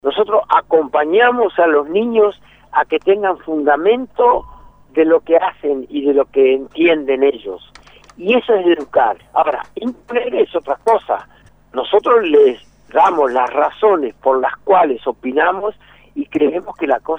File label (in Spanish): GRITO